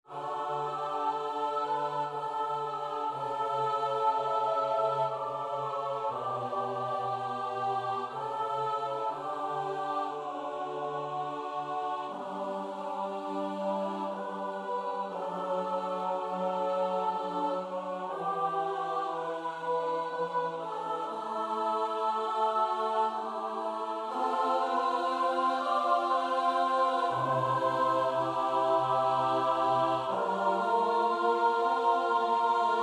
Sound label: midi; alleluja; Biblioteka Utworów Chóralnych przygotowana przez Towarzystwo Muzyczne im. Edwina Kowalika